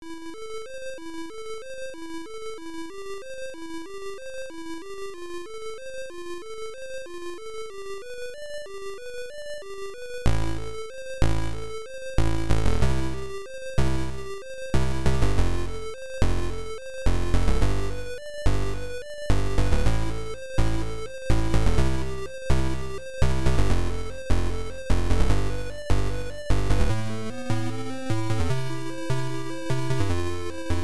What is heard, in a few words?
A Piano Overture